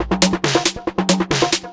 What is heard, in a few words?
drm 138, Techno, drum-loops; Techno ID